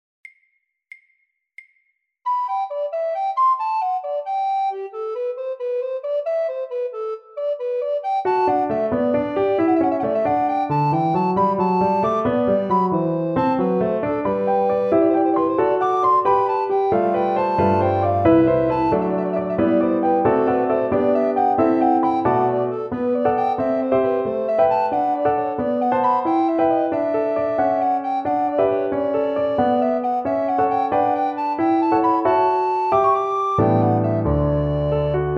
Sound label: Recorder